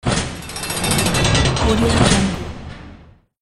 metal rolling gate; noobtorio

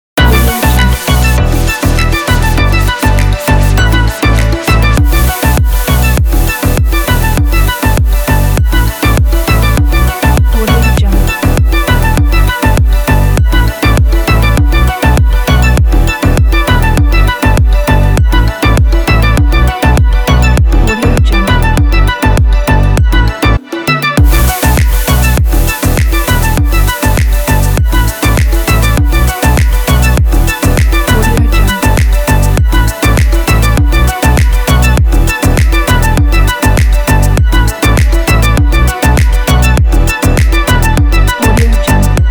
tropical house flute

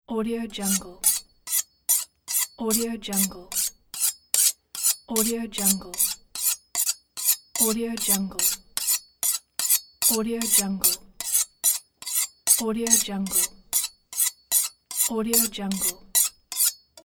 knife sharpening; mediastockmusic

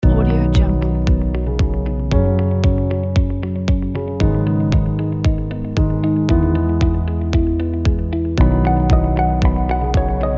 light corporate background

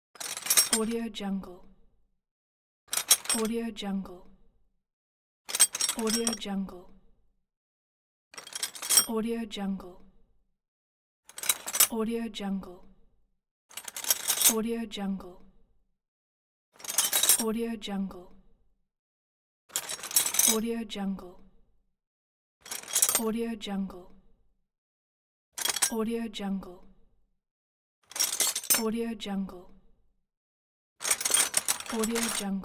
drop tools

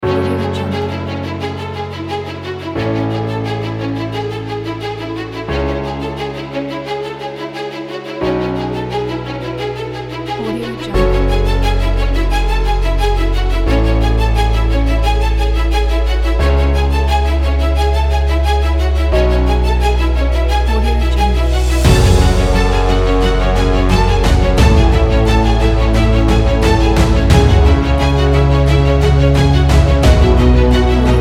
epic cinematic drama

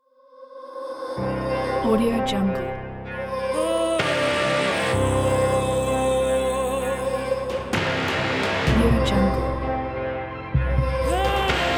emotional uplifting cinematic trailer ident

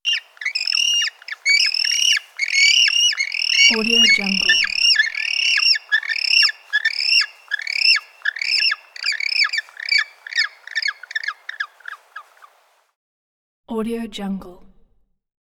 penguin vocalizations; hollywoodedge

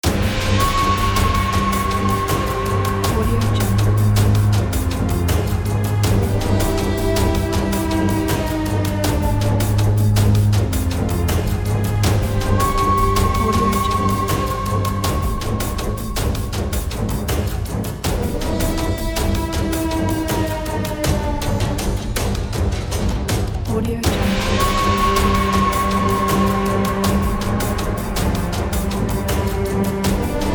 eastern movie trailer

